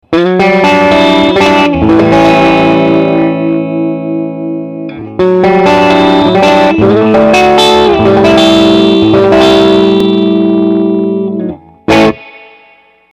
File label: firebird pickup middle dirtier; Jason Lollar